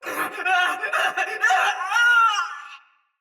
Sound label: Pain Heavy Soldier Mace Agent Female; Battle; Sinecure Melee